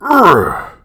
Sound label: EXERT; DN3D; Duke Nukem 3D